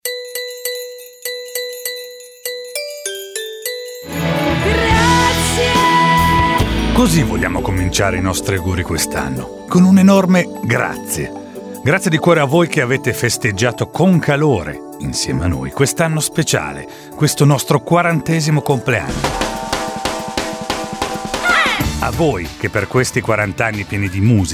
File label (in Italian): Jingle Feste